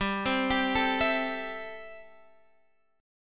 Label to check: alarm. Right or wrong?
Wrong